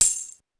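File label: Tambourine, Pitched Up; Clavia DDrum-AT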